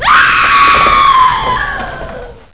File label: scream